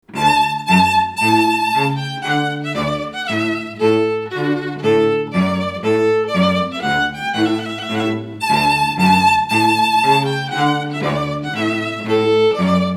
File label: Trumpet Tune string duo